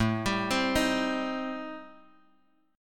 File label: A Minor 7th Flat 5th, Guitar, Open G